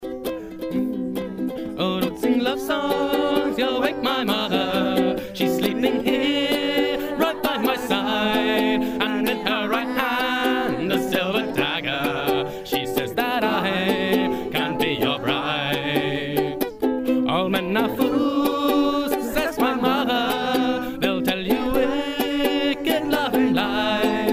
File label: Silver Dagger (Jaunty Ukelele)